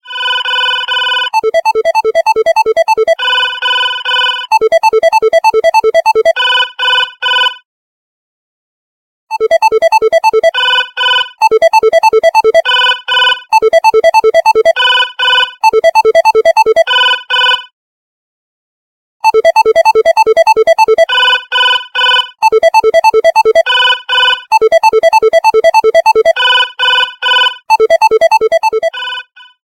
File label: Loudest alarm clock